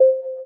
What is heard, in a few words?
scan